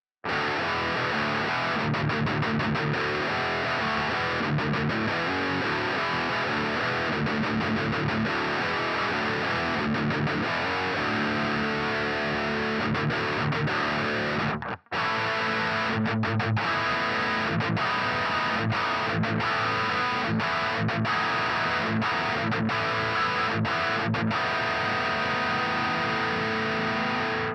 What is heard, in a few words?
metal, guitar amp simulation